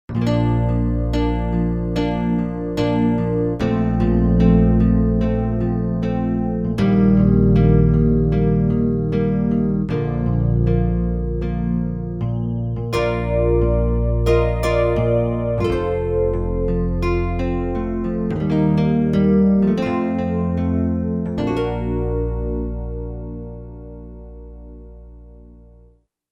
Nylon Rhodes; Factory Patches

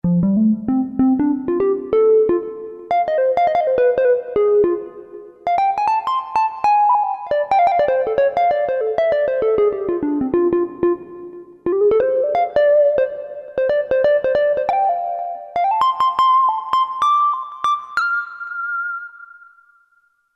HAMMER LEAD; Akai SG01v

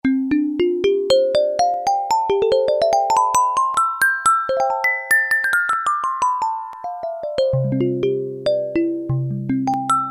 Celeste, Yamaha TX81Z

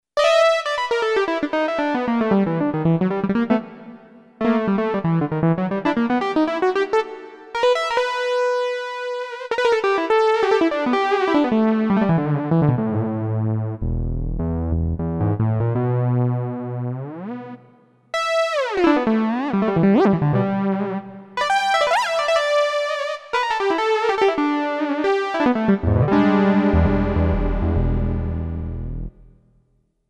Fusiony, Oberheim OB-8

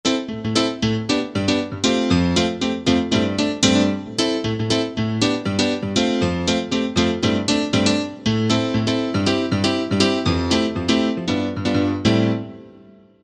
M1 Piano, Korg TR-Rack

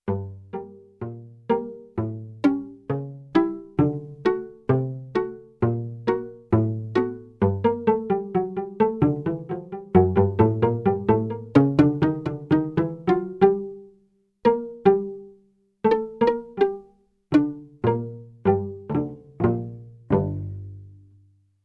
Pizzicato Strings; Yamaha TX16W

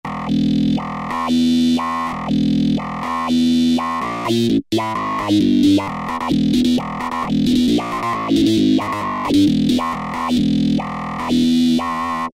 Human Bass; Roland SRX-05 Supreme Dance

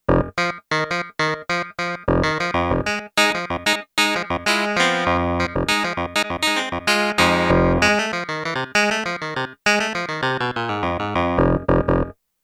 Clav, Kurzweil K2500X; Factory Programs

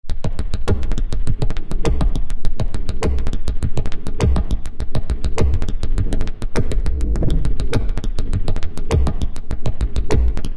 Saw Loop, Kurzweil K2000; LOOPS; Kurzweil K2000 Contemporary ROM; Contemporary ROM Programs and Demos diskette

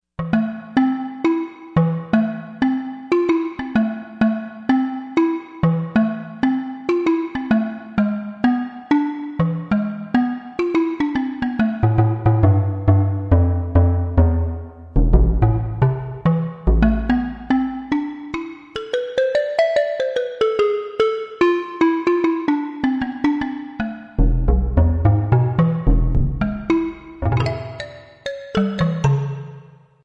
Takerimba, Yamaha TG55; Factory Presets

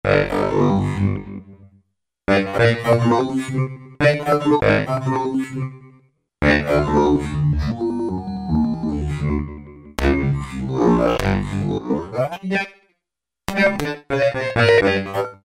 Talkbox, Roland V-Synth

